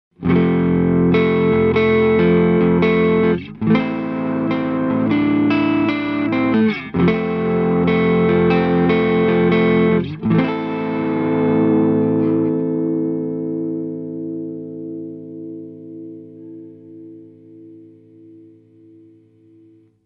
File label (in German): Korg NuTekt TR-S mehr Gain P-90